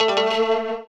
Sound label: scatter Hit; netent; reelsteal